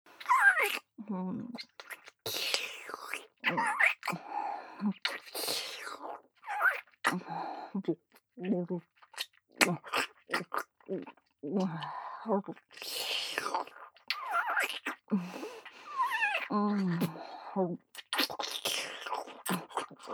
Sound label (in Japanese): ディープキス; ネモナ